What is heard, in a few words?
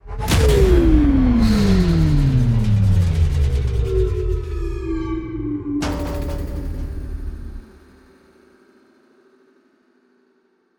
Station Shut Down